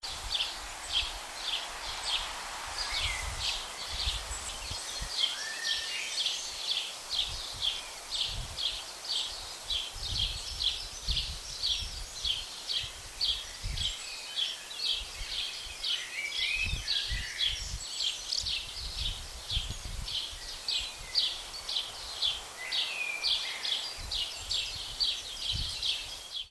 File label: birds cheeping wind